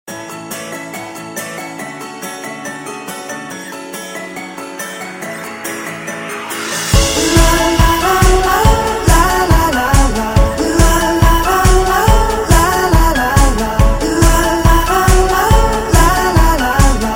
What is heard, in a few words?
LG Tune; LG6400